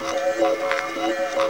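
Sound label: JAW HARP 81 BPM; MACHINES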